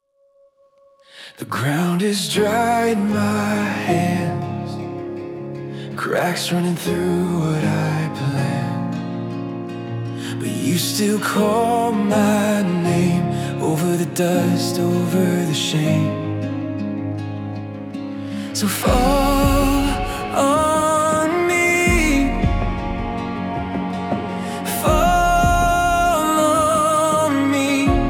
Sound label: Healing Rain